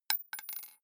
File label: gun bullet drop; krieg-waffen; geschoss